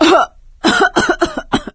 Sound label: cough, survivor voice; source engine; left 4 dead 2; producer